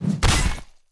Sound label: bigaxe